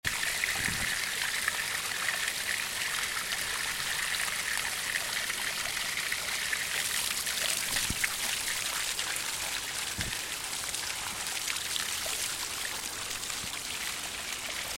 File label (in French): AMBIANCE SOURCE RUISSEAU; college vallee du thore